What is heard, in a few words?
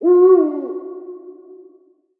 owl; ambient soundscapes; diretide owl; dota